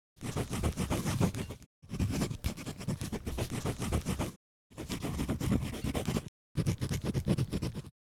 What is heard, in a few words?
Pen scribbling on paper steady and short